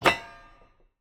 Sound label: Catch, 磁石吸着音; magnet